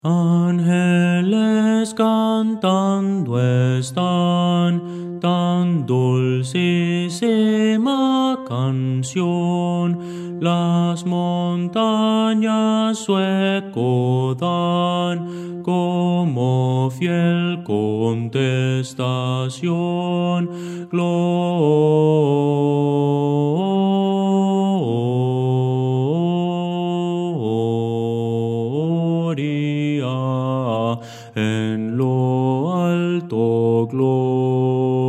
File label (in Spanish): bajo; Voces para coro